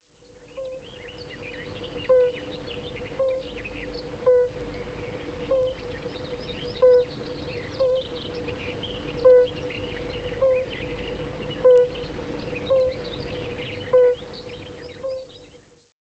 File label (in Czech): kunka obecna; obojzivelnici